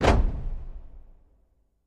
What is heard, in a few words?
Staff Hit Single, spears & staffs